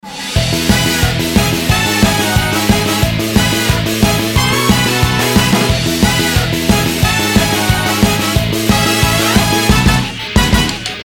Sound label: Horns; Chris Hein Horns